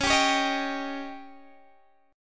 C sharp Suspended 2nd Flat 5th C sharp Guitar Open D, chord